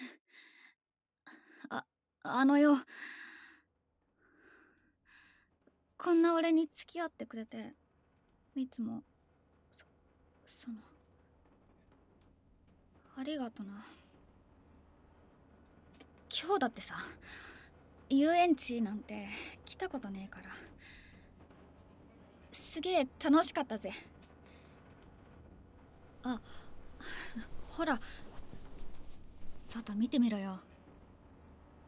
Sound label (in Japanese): はじめてのディープキス; SEあり